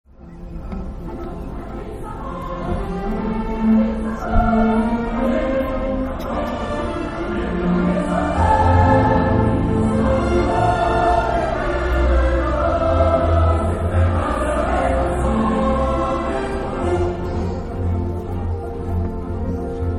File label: Orch; Lille; valenciennes